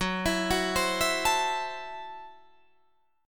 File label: F sharp Minor 7th Flat 5th F sharp Guitar Drop D